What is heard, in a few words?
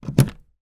household mains plug into wall socket 2 pin australian